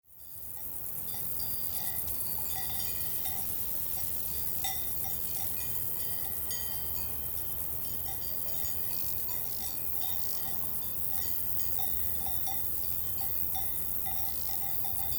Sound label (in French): Ambiance alpage Vercors